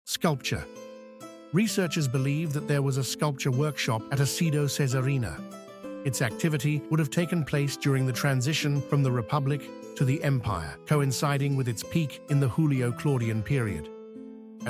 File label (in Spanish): música; archaeological museum audio guide